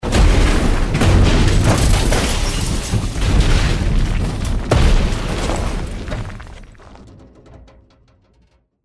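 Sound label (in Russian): сваливается в крутую обочину бетон и щебень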